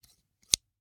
Scissors, snap, snip, shear, large, single

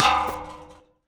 Street Ball Metal Pole Hit; SFX Kit; Street Basketball

